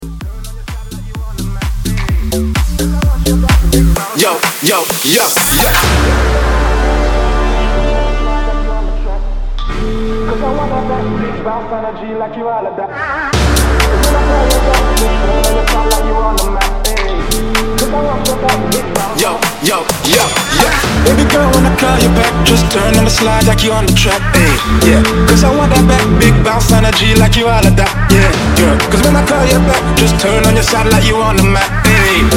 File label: 128bpm